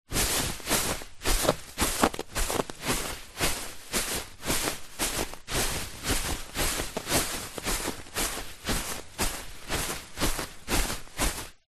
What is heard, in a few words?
foley footsteps beanbag walking